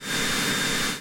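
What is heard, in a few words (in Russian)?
шум деревообрабатывающего станка в большом цеху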